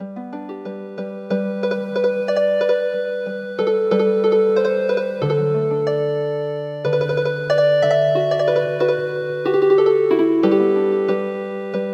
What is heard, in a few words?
Healin Harp